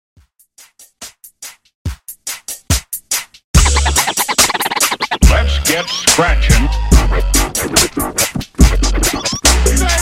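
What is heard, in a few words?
Pony Funk Scratch Mashup, 71Bpm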